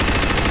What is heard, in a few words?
minigun; Chopper2K; Revista CD Expert 45; minigunold